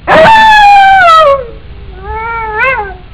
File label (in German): Jaulen